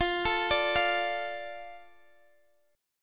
F 6th, Guitar DADGAD; x,x,15,14,17,15; arpeggiated

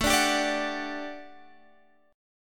G Major 7th Suspended 2nd A

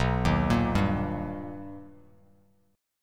B Augmented Major 7th B Bass Standard, chord; arpeggiated; JGuitar

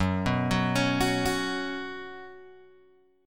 F sharp Suspended 4th, Guitar, Open G

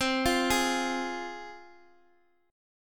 C Suspended 4th Sharp 5th C Guitar Standard; arpeggiated; 20,20,18,x,x,x; JGuitar

